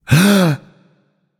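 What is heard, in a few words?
non verbal breath, voice; cernunnos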